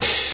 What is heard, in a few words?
sharp open hat sample d key, open hat tuned to the D note